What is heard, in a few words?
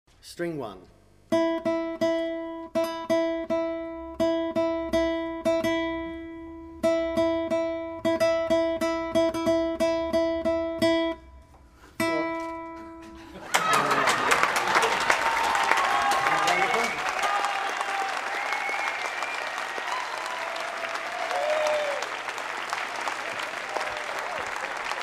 String One